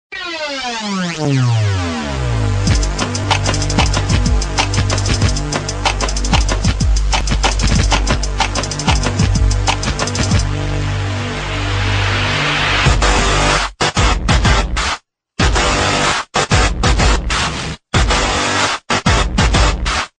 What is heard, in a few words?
pop ringtone